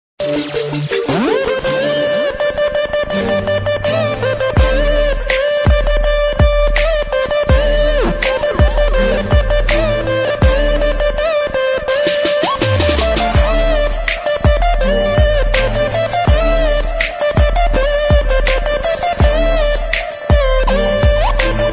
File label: music ringtone english ringtone